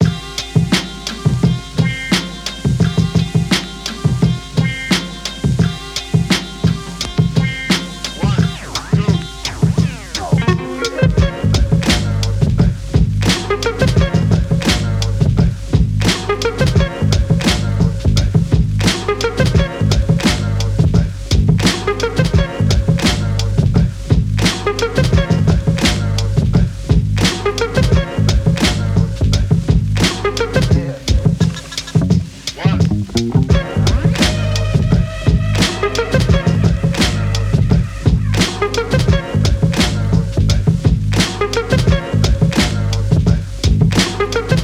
Lofi

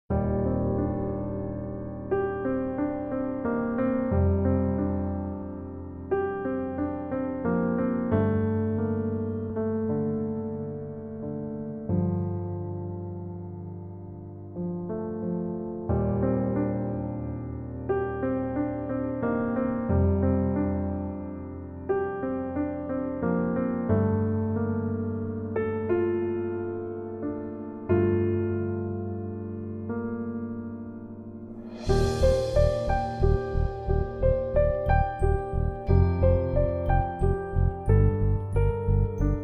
Piano

